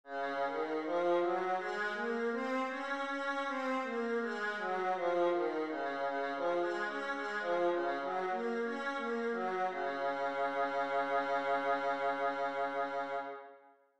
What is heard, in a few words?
viola d flat major, scales